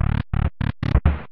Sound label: door